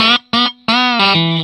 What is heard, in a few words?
RIFF 120, Vintage Blues Guitar